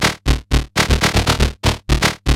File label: SH101 LOOPS; Syntec; Wall of Sounds; TECHNO-BOX